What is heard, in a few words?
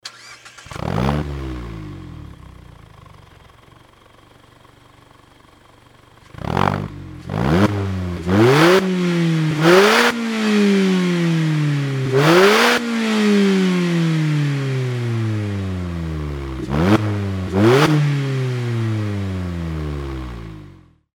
nd roadster fujimura bf; nd5rc